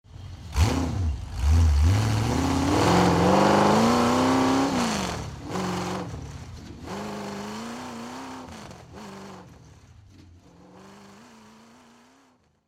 Chevy V8 Hot Rod Start Accelerate Drive Away, Hot Rod, rev engine and accelerate away; Hot Rod sounds